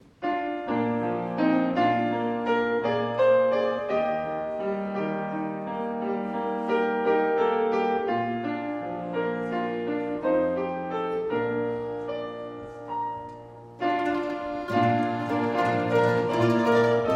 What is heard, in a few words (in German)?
Orchester Es toenen helle Glocken